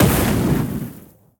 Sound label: Rocketland; Likho